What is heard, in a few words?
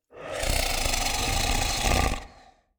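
Zombie; Clam; Blind Survivor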